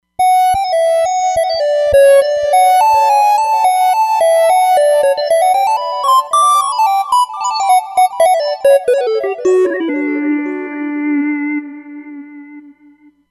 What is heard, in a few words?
Living in Sine, Roland Fantom XR